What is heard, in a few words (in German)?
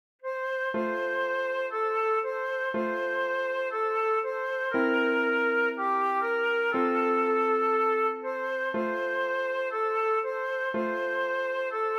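Ihr Kinderlein kommet Querflöte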